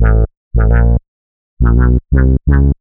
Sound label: Bassline 85 A, hiphop, 85bpm, Bass; musicradar